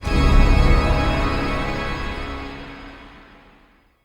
Organ Shot; Encounters; Ode Pollution Tower

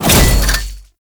Void Dragon Grapple Connect; Enemies; Gameplay; Warframe; Duviri

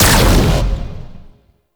Alt Fire Shot, Weapons; Warframe; Corpus; Tenet Quanta

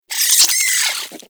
Vocal Death, Hunting Critters; Nexifera

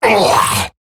Pain Eximus Grineer Male; Battle Barks

